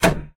carrier missile click; Original TK Sounds

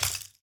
broken glass step; Golden Crayon Sounds

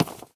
concrete step; Golden Crayon Sounds

